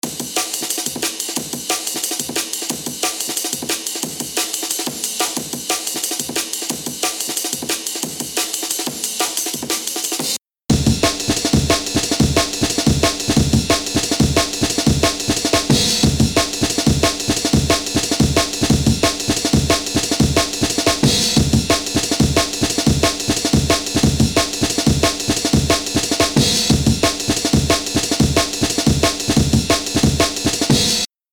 breaks

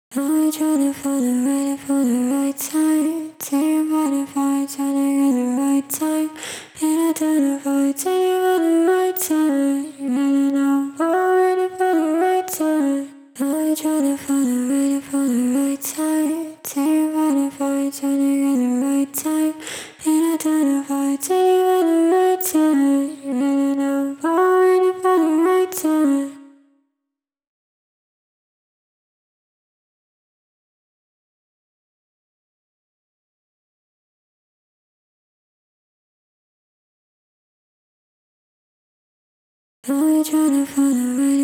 vox

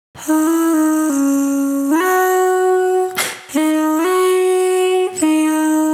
vox

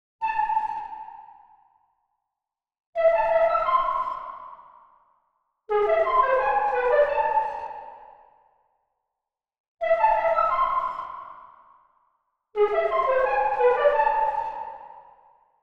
lead

